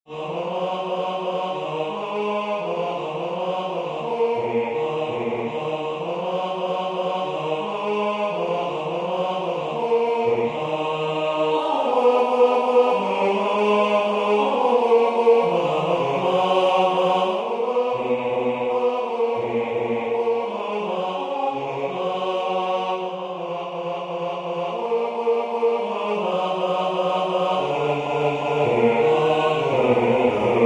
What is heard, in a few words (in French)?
basse; Accourez choeurs angeliques